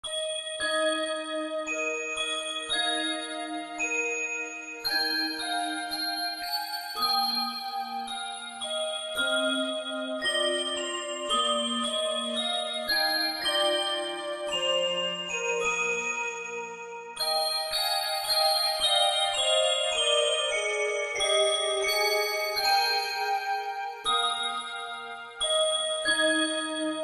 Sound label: handbells